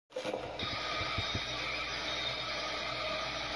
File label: yell; whitty